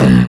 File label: Human Perc, Body Perc